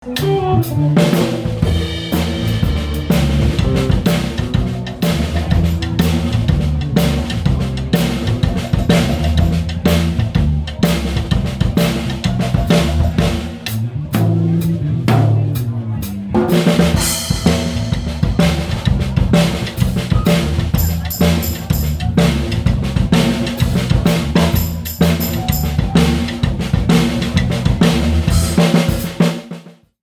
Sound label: drums